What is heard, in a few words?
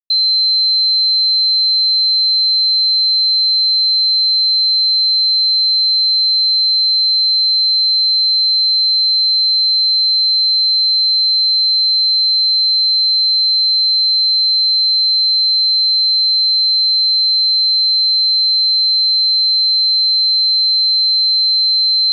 Locomotive Overspeed